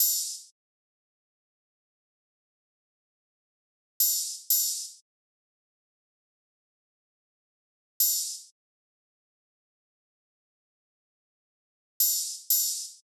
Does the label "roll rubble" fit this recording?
No